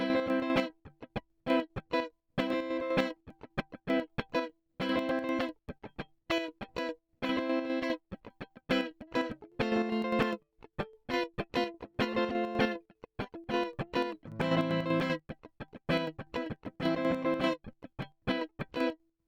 Guitar Rythm Tremolo square

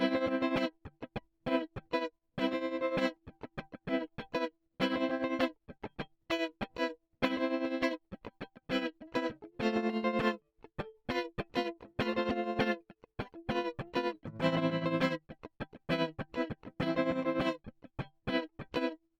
Guitar Rythm Tremolo Depth75 Freq20 triangle

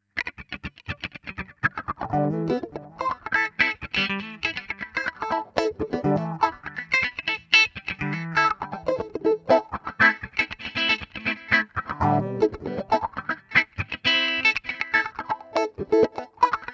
Auto wah

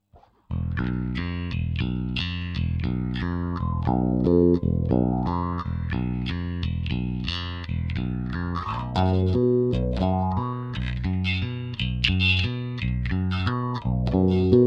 Auto wah

